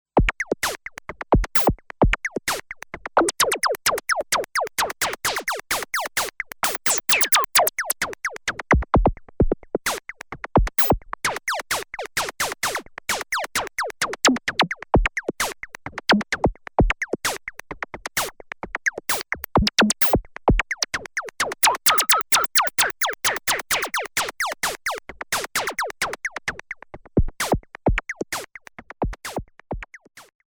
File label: Korg Monologue Filter; Robot Empire